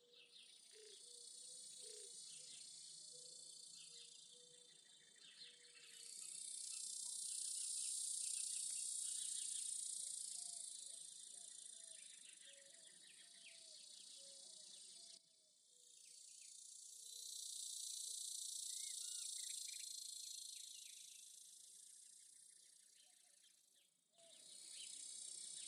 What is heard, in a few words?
cicadas desert; ambient